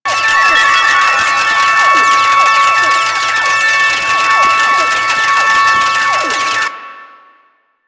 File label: Large Arcade Gambling Machine Loop; Warframe; Ambience; NineteenNinetyNine